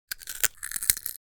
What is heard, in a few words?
Cartoon crunching bite